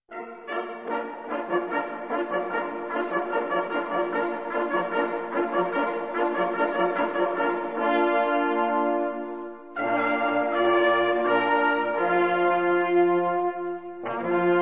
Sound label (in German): fuchstot, signale